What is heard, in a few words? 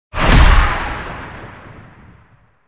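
powerful danger accent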